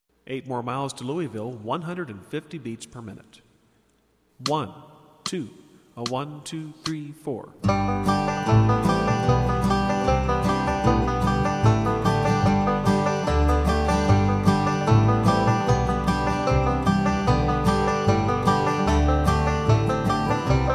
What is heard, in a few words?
150bpm, banjo